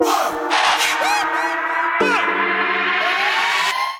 Chants & Stabs Kit; Drums; Ableton Factory Packs; Beat Tools

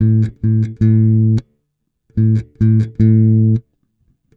110 Pop Basic Bass A, E-Bass; Pop Rock

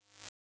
Plastic Reverse; Experimental; Ableton; Convolution Reverb

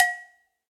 Cowbell Mid WarmAndLow; Drum Hits; Ableton; Factory Packs; Session Drums Club; Standard Hits

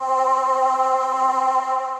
Voco+ External Eeh, Voices; Ableton; Glitch and Wash

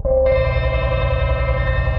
They Descend, Synth Pad; Ableton; Glitch and Wash

